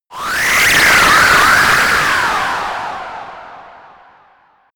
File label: quavery air